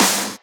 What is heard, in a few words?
Snares; Vengeance - Essential Clubsounds Vol.4